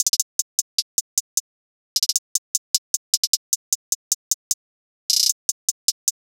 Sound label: Hihat Loop - 153 BPM; Homeland; Cymatics; ANALOG EVOLUTION Beta